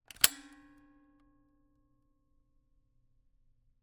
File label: Camera, Kodak EK2 Instant, polaroid, large, plastic, springs, snap; Eiravaein Works; Vaeyan IV